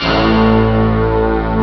midi stack bass, Amiga Soundtracker Sample Packs; ST-XX Amiga Soundtracker Sample Packs

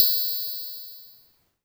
polyvox perc, Polivoks; Formanta Radio Plant

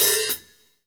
hat open n close, Live Kit; Sonic Foundry (Sony Creative Software); Ugly Remnants VOL-2; Single Shots; Beatstuff

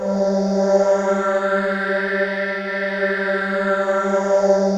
x h a l e, Korg M-1; Rubber Chicken Software Sample Bank

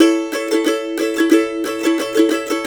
COUNTRY

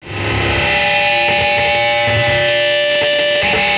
Feedback, Industrial; Nasty

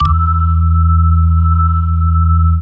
ORG, Hip-Hop & R&B; USB Soundscan vol.47; AKAI

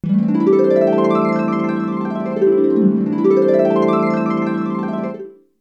Melody oneshot; ZERO G - Datafile DISC 3

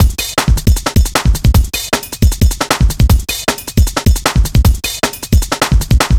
155, breaks

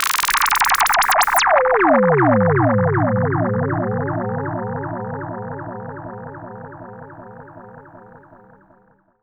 SH-09 Delay

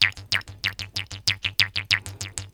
KHOMUS; USB Soundscan vol.60 - Asian Traditions; AKAI

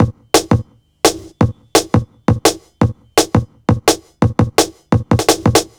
170 break, Explosive Jungle

